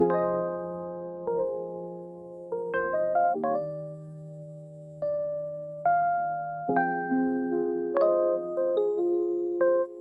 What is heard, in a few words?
rainy lofi